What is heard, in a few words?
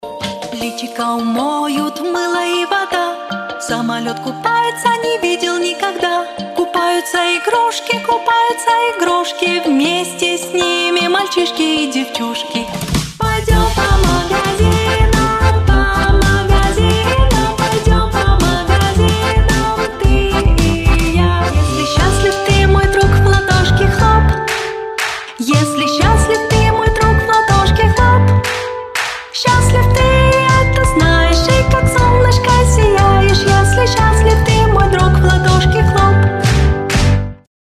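vocal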